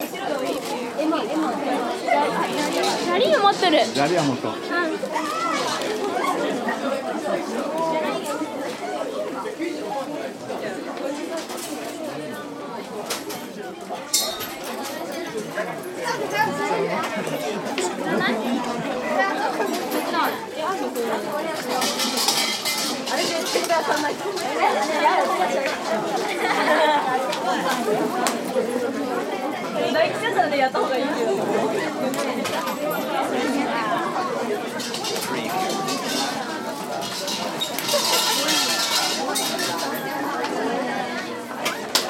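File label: ambience crowd chatter clanks Tokyo Japan market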